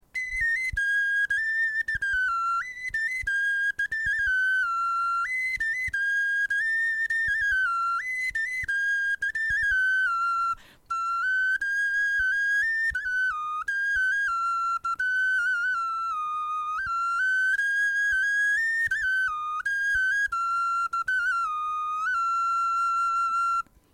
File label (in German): melodie